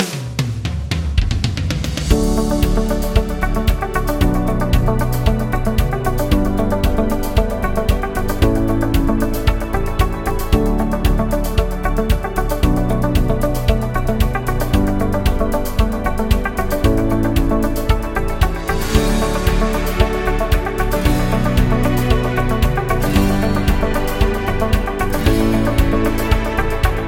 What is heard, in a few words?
Background Interview; positive vibe